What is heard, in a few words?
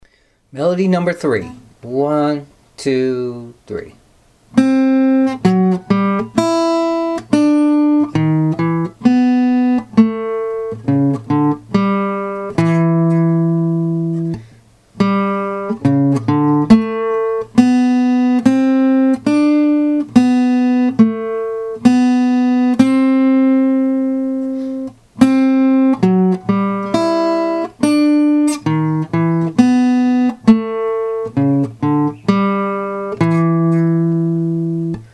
melody